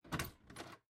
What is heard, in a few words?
Door Open; miside demo windows gamerip 2023